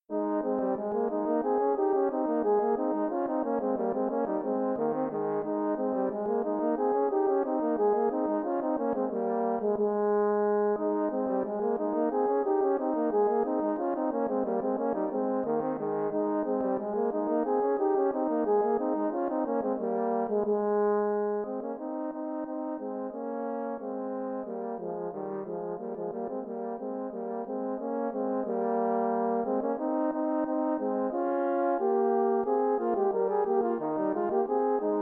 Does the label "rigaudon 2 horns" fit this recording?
Yes